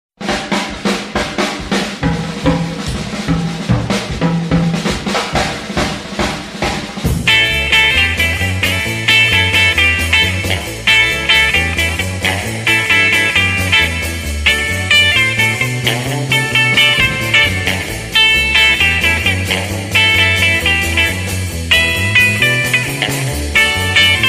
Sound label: Bongo Blues